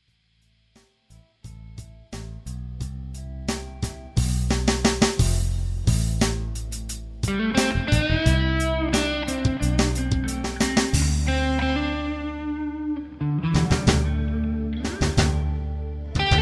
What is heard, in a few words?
humbucker Mitte Fender solo